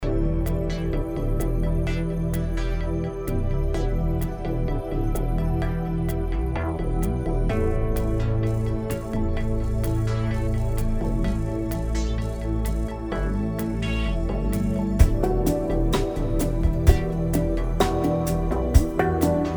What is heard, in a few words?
HANG DRUM REFLECTIONS